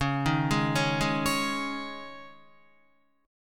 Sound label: Db Suspended 2nd Guitar 4E,4A,5D,5G,5C,6F; arpeggiated; Dbsus2; JGuitar